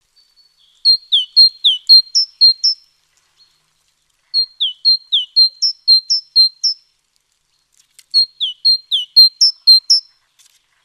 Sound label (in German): Stimme der Kohlmeise